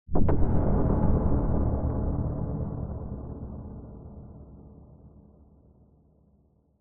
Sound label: cinematic hit dark tense reverb; sound design